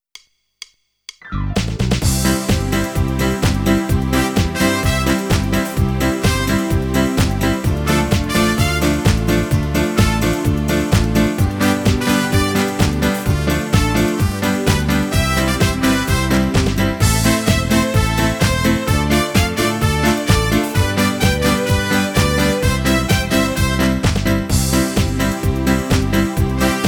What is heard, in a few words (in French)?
l ambiance du bal musette playback